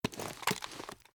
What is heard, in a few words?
ar gl gl reload raise; weapons; akilo47; viper